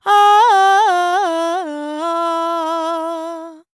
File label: World Voices, INDIA; ILIO; Vocal Planet